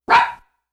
Small Dog Single Bark

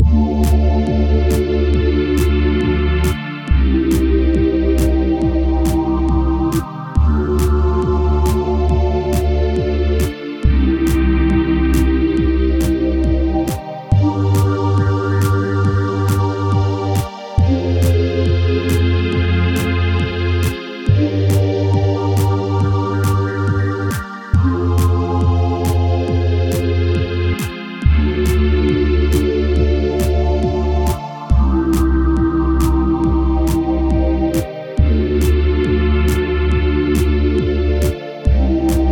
Strange Game Menu, fantasy

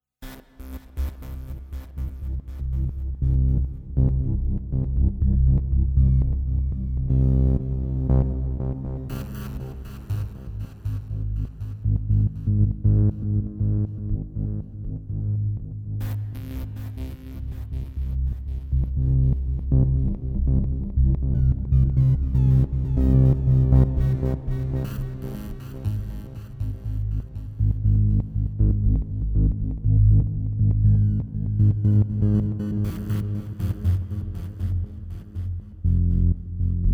Electro Drone

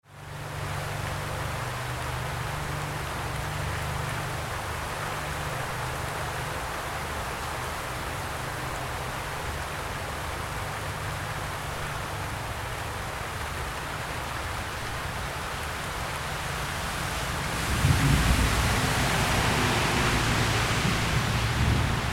Light Rain Thunder

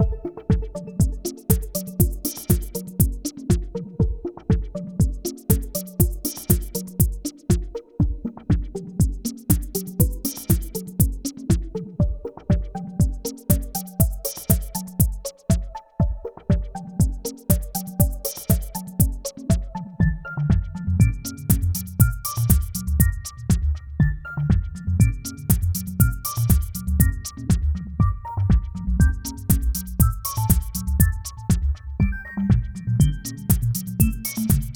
Electric Raindrops, puzzle music

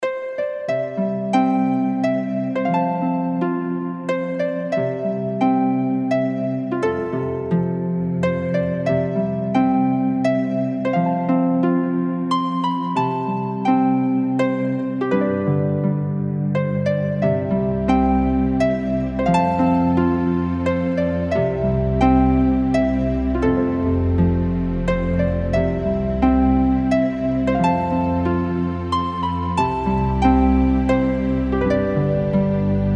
Blue Ridge Looping

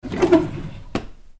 Kitchen Drawer Close

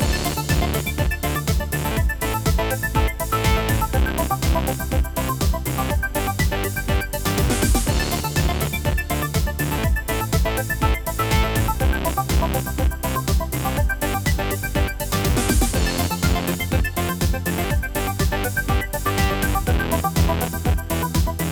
Grungy Old Code, sci fi

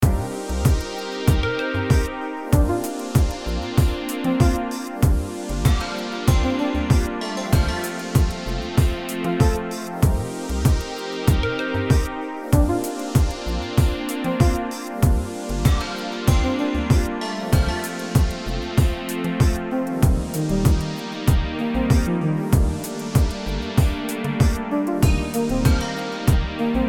Balloon Fantasy

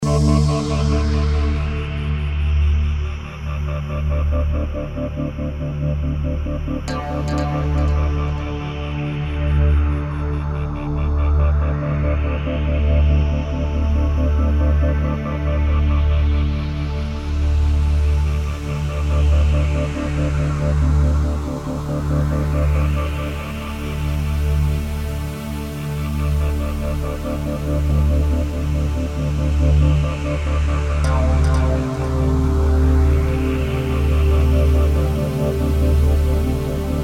Mech Game Menu Looping

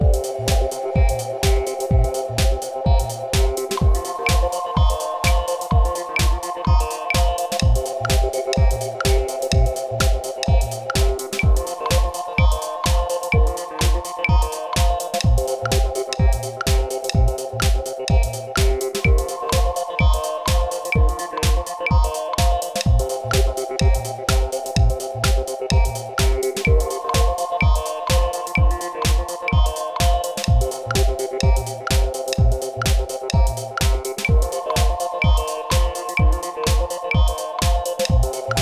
Tick Tock Game Looping, fantasy

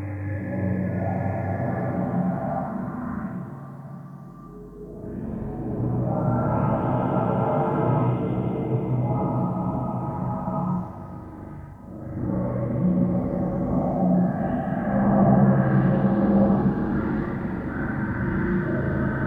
Sewer Monsters Town Hall Meeting Looping; horror surreal

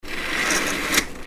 Blinds Closing

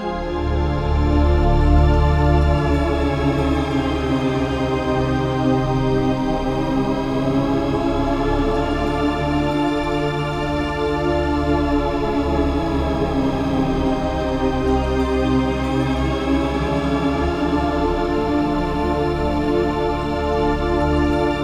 Surreal Game Menu, horror surreal

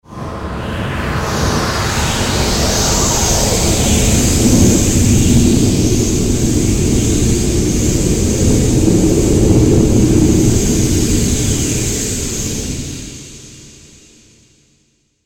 Air Lock

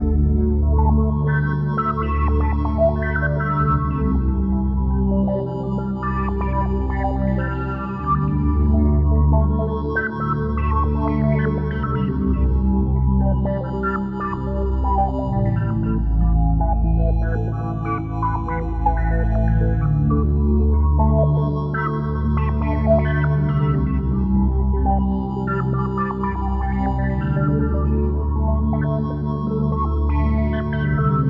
Machine Evolution, sci fi

